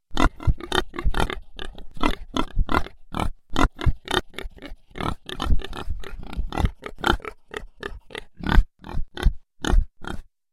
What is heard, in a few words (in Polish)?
dzik